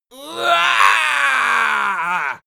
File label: goblin attack